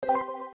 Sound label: Shutter Sound, Event sounds; LG KF900 Prada II